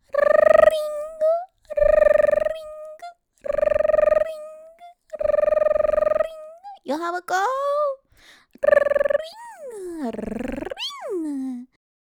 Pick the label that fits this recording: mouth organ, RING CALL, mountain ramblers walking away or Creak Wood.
RING CALL